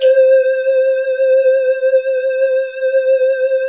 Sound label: LEADS; Masterbits; Vintage Voltage